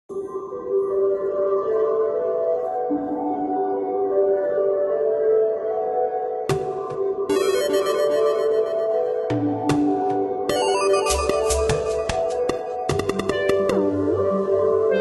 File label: Music Space Day